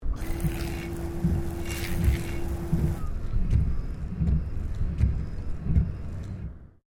Car Toyota Corolla windscreen washer exterior; Toyota Corolla 20 09; 2009 Toyota Corolla hatchback; Cars; Vehicles

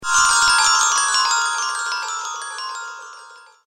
Wizard Sparkle Whoosh; Whoosh Sound Effects; fantasy sound effect; role playing games, magic videogames; Andrew Fleming

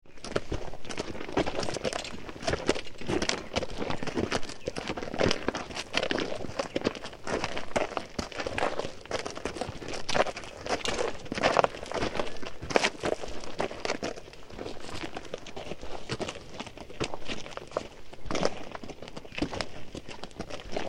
Trekking People and Ponies On Rough Ground; Small Group On Dirt And Rock; Horse; Tom Hutchings

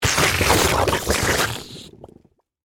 Mud Suction, Impact, Mud, Impact, Suction; BlastWave

